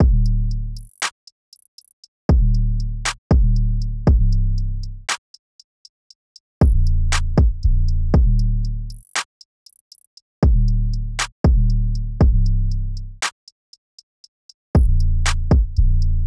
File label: Drum Loop (118 BPM); xephyrisdead